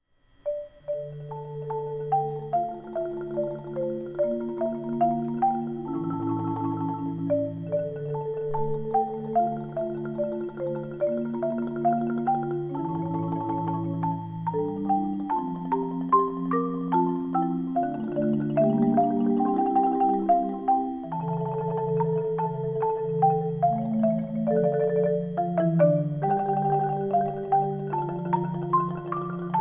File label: Mallet